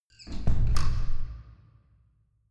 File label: closing church door